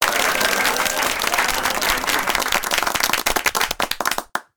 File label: clapping hands